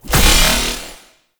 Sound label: Pickup Dispenser Energy; Tau; Twelve Minute War; Pickup Dispensers